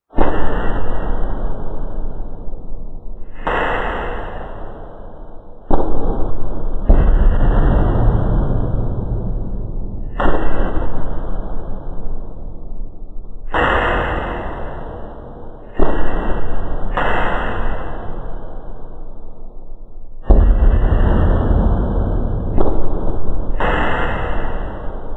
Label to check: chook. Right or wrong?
Wrong